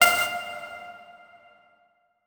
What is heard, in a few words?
Multimedia Internet CD-Rom Flash Beep Mechanical